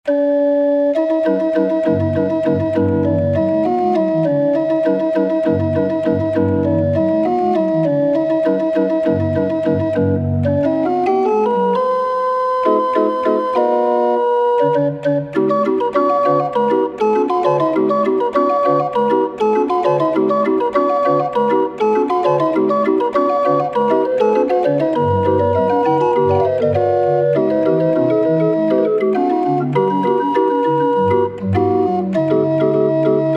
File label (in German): 36er Drehorgel